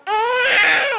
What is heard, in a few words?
crying, baby cry